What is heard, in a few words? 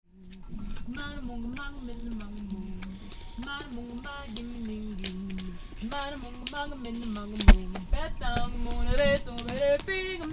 Improv Chant; 2004 Rainbow Gathering california